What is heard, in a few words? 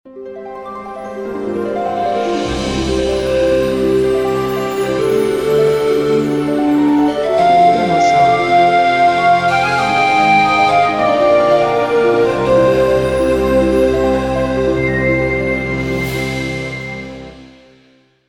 Magic Soft Bright Intro or Happy Ending, Jingling